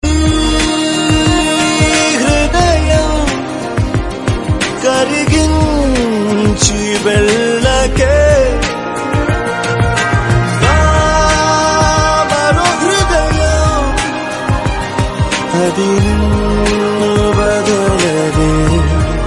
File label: Telugu Song; ringtones